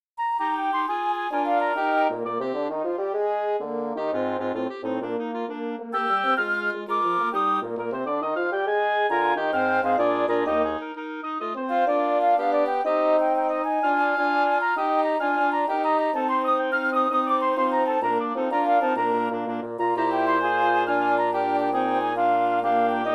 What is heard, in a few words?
quintet finale wind Quintet; reicha